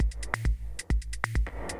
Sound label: Tech loop